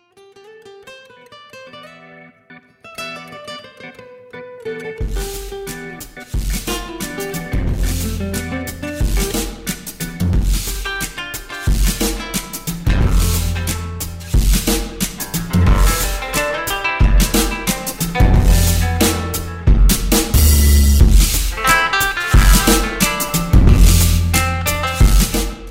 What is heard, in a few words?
Abstract Music Ringtone